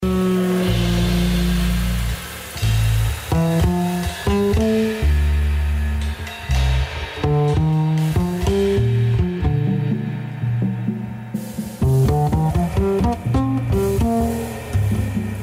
jazz

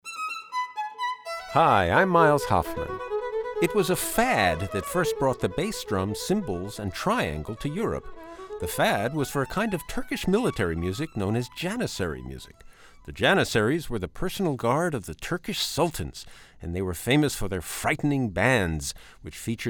Bass Drum Cymbals Triangle

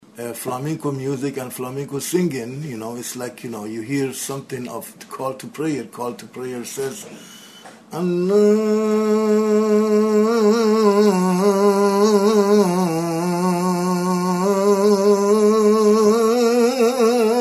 call to prayer, flamenco

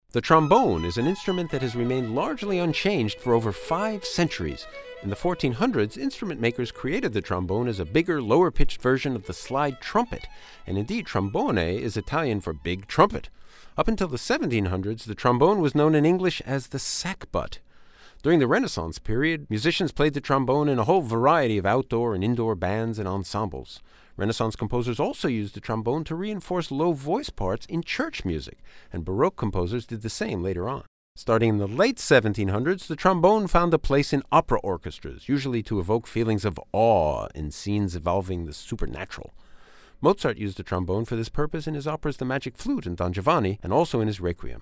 Trombone

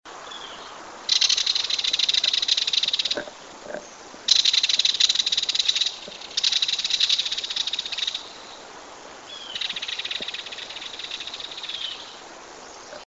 belted kingfisher; mike nelson

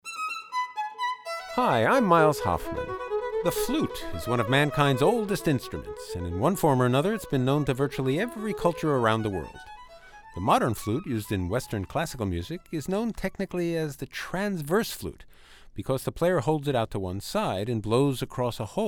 flute

